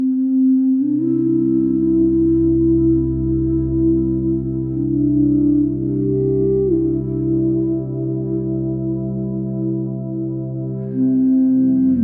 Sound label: Angelic Hymn, music